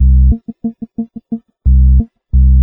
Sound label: funky wait, Electronic Loops